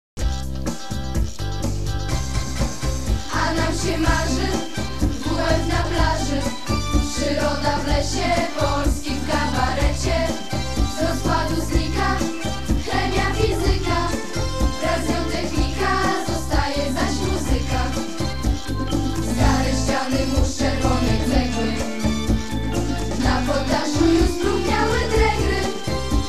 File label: hymn; sztandar i piesn szkoly; potegowo spskorowo